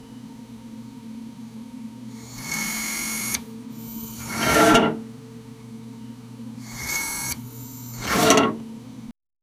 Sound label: mechanical disk sliding